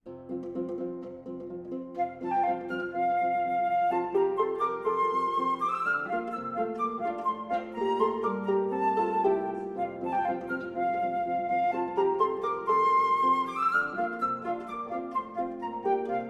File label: music, harp and flute